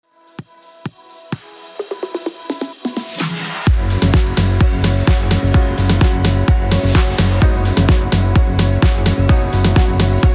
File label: PROGRESSIVE HOUSE 128 BPM